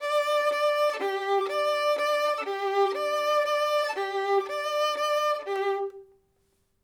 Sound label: violin